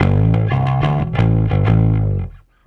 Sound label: Bass